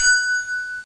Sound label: ting